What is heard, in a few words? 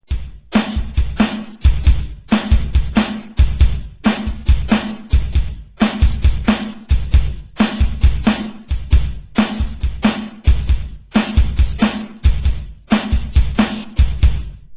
instrument